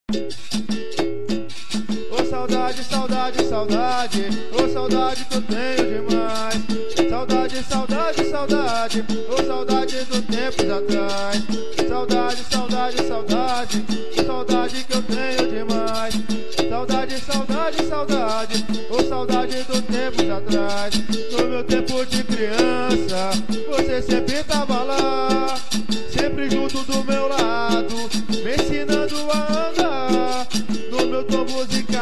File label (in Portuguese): capoeira tribal